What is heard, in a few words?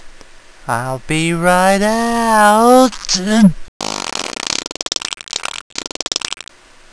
wet fart; poolparty